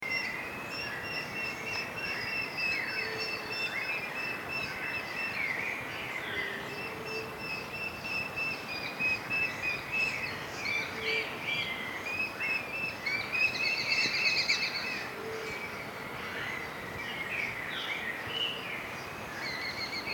Falco rufigularis female male; Vleermuisvalk; roofvogels; Falconidae; Falconae

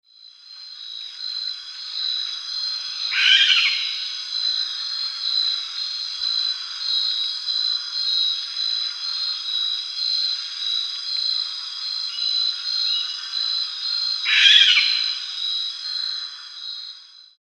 Tyto rosenbergii, Sulawesi kerkuil; uilen; Tytonidae

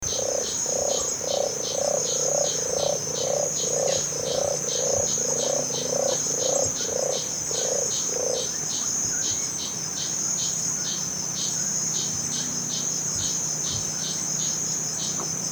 Ninox forbesi, Tanimbarvalkuil; uilen; Strigidae

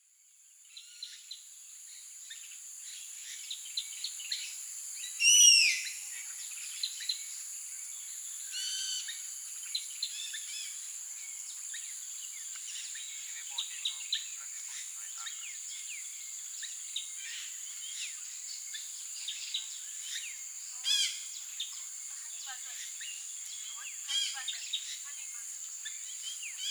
Pernis ptilorhynchus; Maleise wespendief; roofvogels; Accipitrinae; Accipitres; Accipitidae

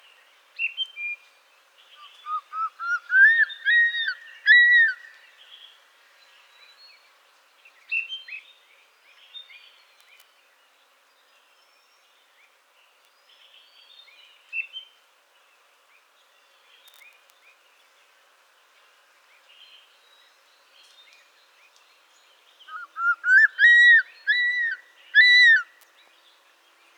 Spilornis cheela ricketti; Indische kuifarend; roofvogels; Accipitrinae; Accipitres; Accipitidae

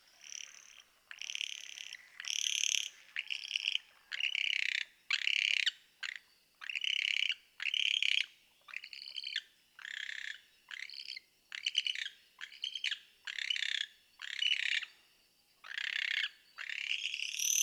Tyto glaucops, Hispaniolakerkuil; uilen; Tytonidae

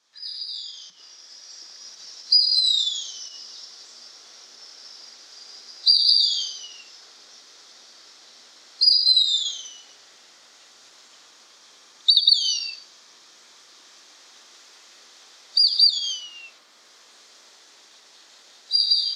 Ictinia mississippiensis; Mississippiwouw; roofvogels; Accipitrinae; Accipitres; Accipitidae

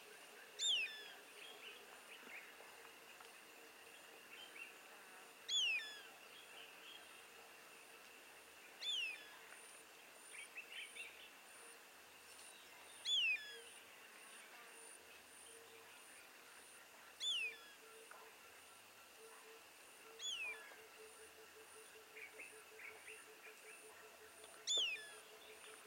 Aviceda cuculoides; roofvogels; Accipitrinae; Afrikaanse Koekoekswouw; Accipitres; Accipitidae

